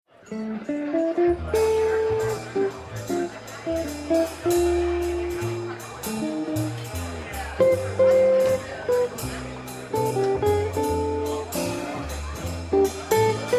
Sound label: Trio